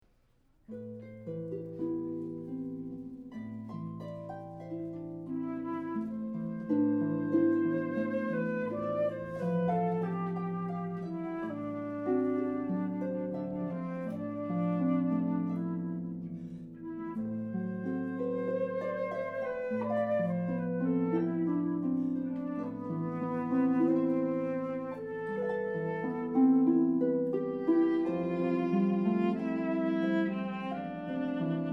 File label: Lullaby